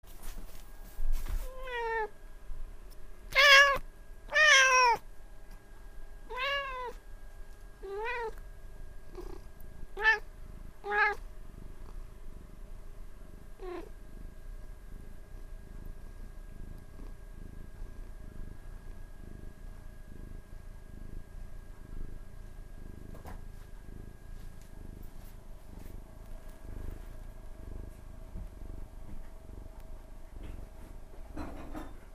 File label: cat